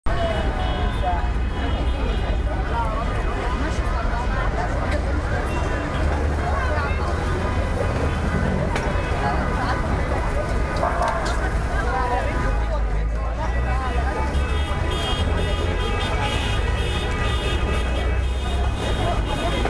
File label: traffic, Cairo